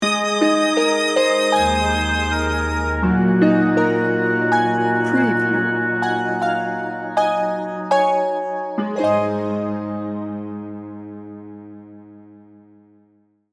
delicate reflections sting bumper

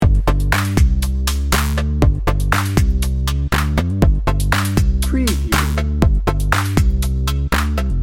urban toes loop

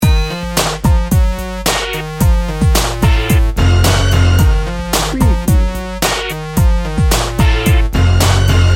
urban renewal loop

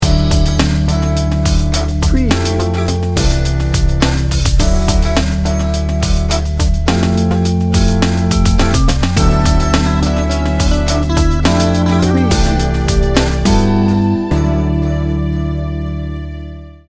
mellow chill jazzy

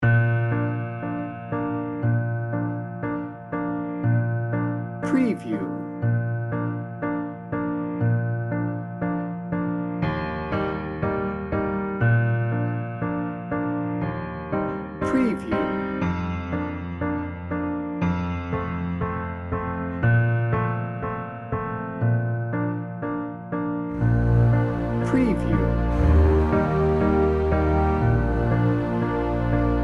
hopeful and heartwarming no drums bed

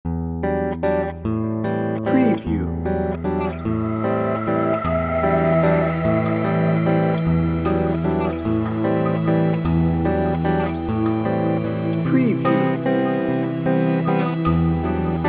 breathing in bed

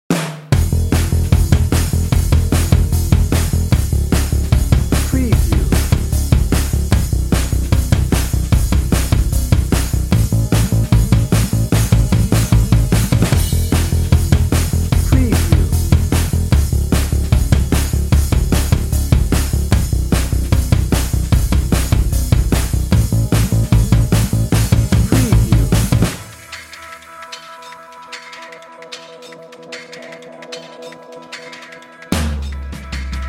instant sunshine dnb

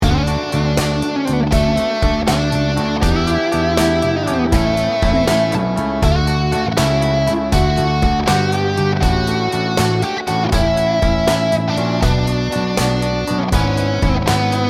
o come all ye faithful

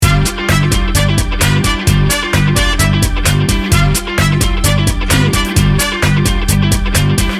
good n funky loop

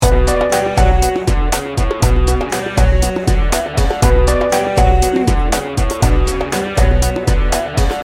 bollywood temple dance loop

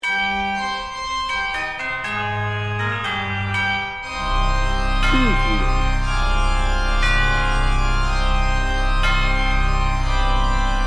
ghost stalker organ choir bells

